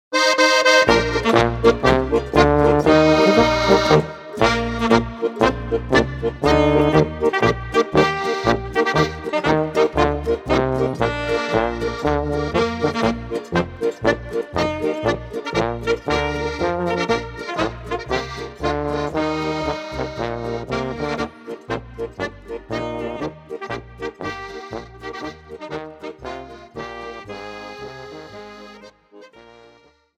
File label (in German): Marsch